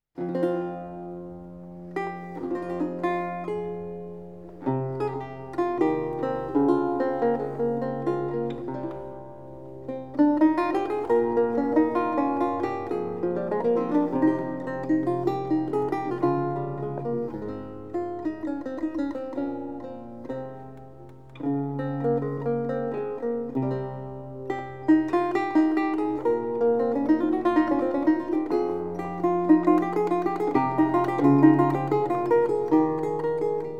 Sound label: Preludium, Lute; Dowland; Anthony Rooley; Margaret Board Lute Book; Decca Collector's Edition